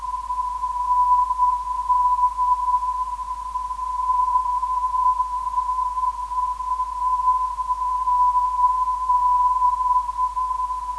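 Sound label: 280kHz, non-directional beacon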